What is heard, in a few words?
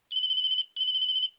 nokia, Klingelton